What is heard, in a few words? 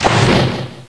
fire arrow; BYOND; Zelda